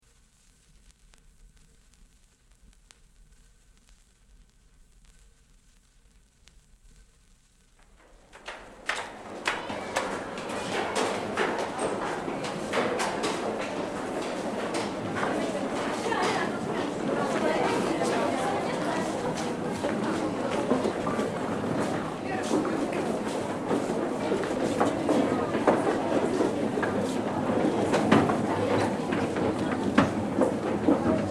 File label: Oxford Circus - Ascent from platform